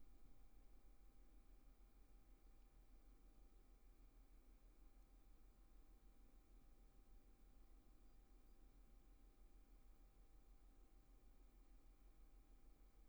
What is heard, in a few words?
roomtone